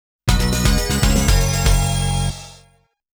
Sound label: Round Clear BGM; Namco Classic Collection Vol.2; arcade soundtrack